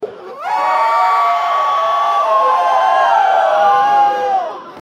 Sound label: the crowd screams booo; events